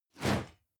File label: whoosh classic; swooshes-swishes